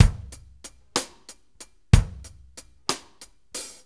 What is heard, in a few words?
6-8 Loop, Full Kit Loops